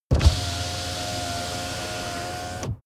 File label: car window back open full